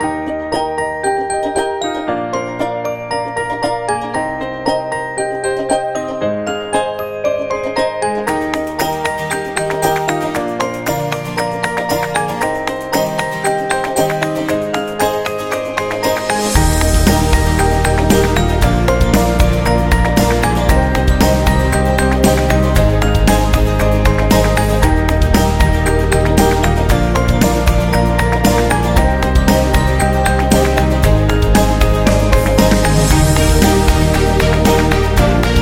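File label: Kids Vlog, Happy and cheerful background music for celebration videos, birthdays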